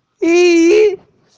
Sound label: Yay